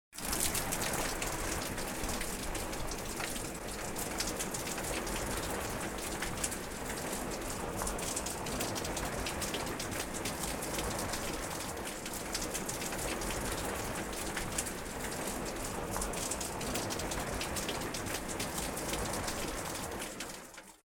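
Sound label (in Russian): много брызг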